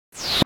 teleport; project hawk

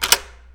unlock; wesnoth; The Hammer of Thursagan

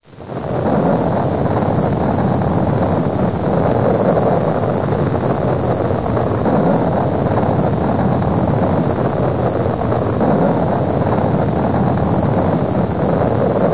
thrust; race into space

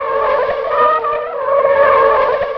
tire squeal; stunt rally; surface

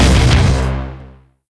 tick

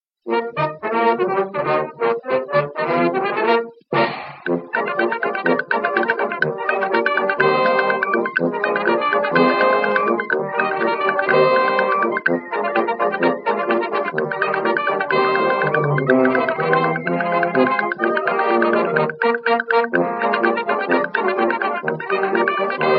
Ragtime; 1907

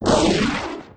sidewinder; endless sky

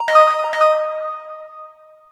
start; falling tim

